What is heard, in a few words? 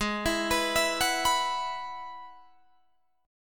Ab Minor 7th Sharp 5th Ab, down, Guitar, Drop D